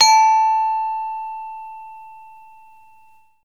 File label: Gamelan balungan pelog